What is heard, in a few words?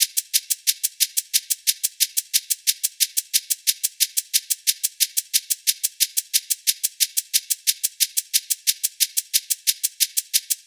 Shakers - 90BPM; Percussion Kits; STEMS; Ghosthack; SkyRealm; Kit 13 - Leap Of Faith